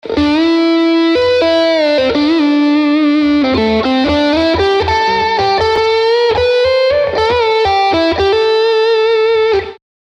Slide Lead

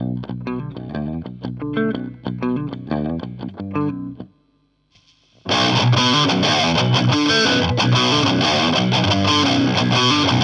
Boss OD 200 X Distortion

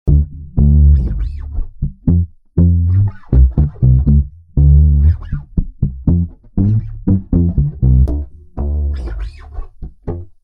Bassline

